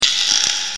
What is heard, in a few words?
vibraslap